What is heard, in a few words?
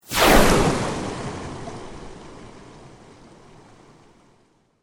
Sweep Organic Medium